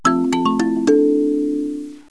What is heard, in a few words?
Musica Windows Start; Win98sounds